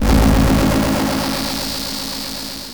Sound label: mechanical noise